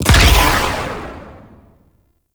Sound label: Artillery Barrage Explosion; Weapons; Powers; Warframe; Archwing